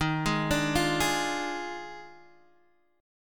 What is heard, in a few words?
Eb Major 7th Suspended 2nd Suspended 4th Eb Guitar Standard; arpeggiated; 11,11,12,10,9,x; EbM7sus2sus4